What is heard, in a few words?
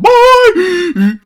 zombi idle; garrysmod